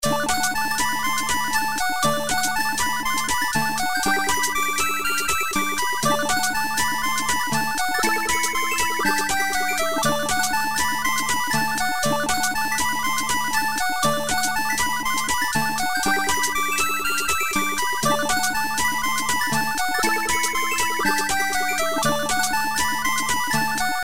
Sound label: 12 Bar Blues